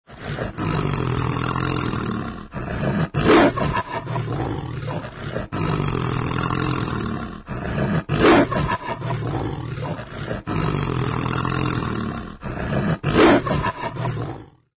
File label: ANIMAL WEREWOLF